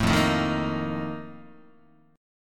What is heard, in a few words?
F 11th F, down, Guitar; F11 strummed